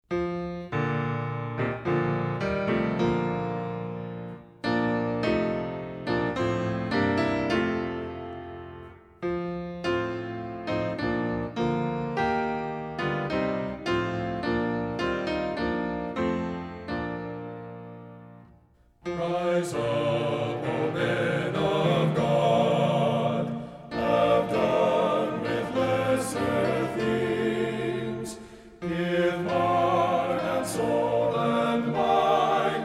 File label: men's choir; rise up o men of god; words and music